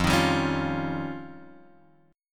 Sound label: F 7th Suspended 2nd Sharp 5th